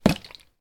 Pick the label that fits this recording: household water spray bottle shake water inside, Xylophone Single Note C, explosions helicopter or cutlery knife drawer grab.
household water spray bottle shake water inside